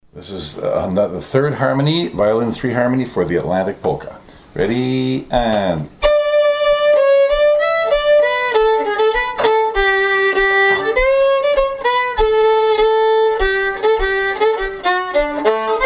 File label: harmony, violin